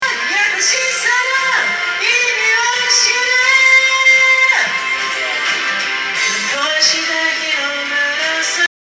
ベルティング サンプル

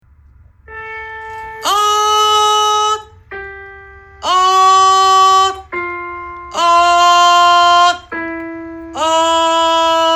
低音の裏声

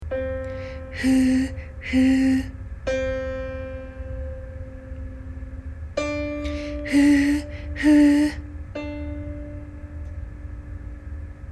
息漏れ; 後ろ上の息漏れ

